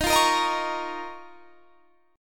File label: Eb 7th Suspended 2nd; strummed